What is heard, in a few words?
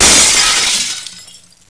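medium glass, impact; SINDEMO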